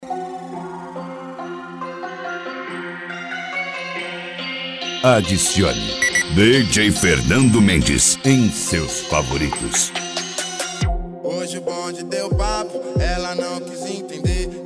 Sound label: trap funk